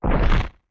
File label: Goron Link Uncurl; Goron Link uncurling